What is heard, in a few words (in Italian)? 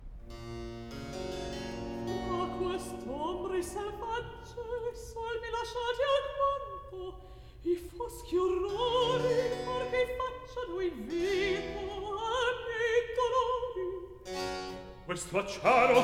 Recitativo; Fra quest'ombre; Handel Masterworks; Act I